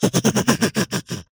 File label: Monster Roar Growl; SoundBits; Screams & Shouts 2 - Monsters